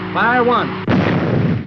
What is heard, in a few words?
Fire